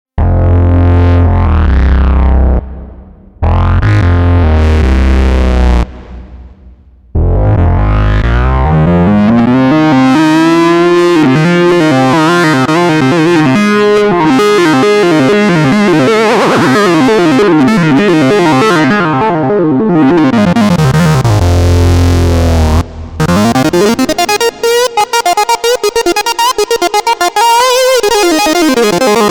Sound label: A Funky Solo, Paraphonic Dual Filter Analog Synth; uno synth pro desktop